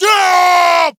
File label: yell Raw; HeavenStudio; ringside